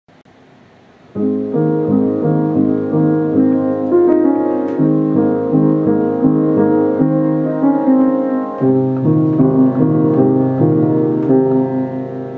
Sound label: piano melody; Sato, Ayaka